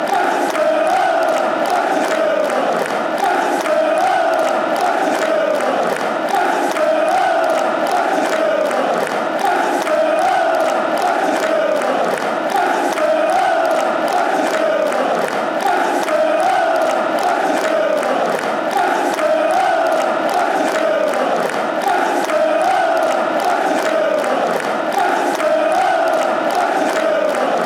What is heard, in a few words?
la la la, fan chants; manchester; mufc